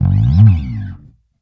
Bass one shot Slide FX High, Electric Bass; Slate Digital BASSHOLE Sample Pack

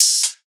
hat stack, drum one shots; Drum Sample Pack; Slate; SEISMIC

